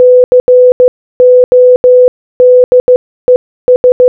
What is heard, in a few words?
CODES Morse Code Audio